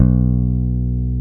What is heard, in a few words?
BAS; Best Service Gigapack 2